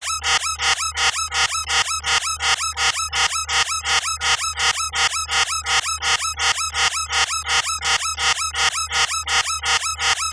science fiction alarm siren warning two tone digital fast